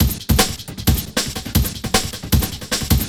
Beat, Futurist Drum & Bass; Drums & Percussion

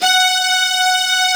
F#3, CELLO SECT; AKAI S6000 CD-ROM - Volume 1; Strings

